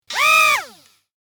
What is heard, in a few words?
Small RC toy servo motor whirring